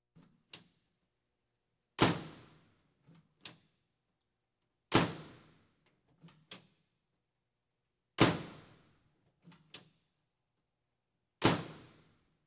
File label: Police, Fire engines